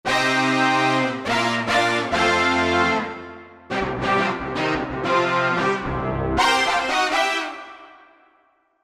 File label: Brass Down, Korg MSC-1S; Korg MSC-1S Variety